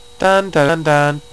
Dun Dah Dun Duh; Twenty-five Beeps #1

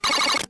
Plane; Falcon4

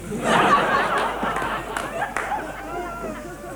HAHAHA

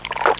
drink

